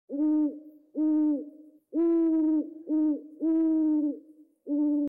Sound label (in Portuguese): coruja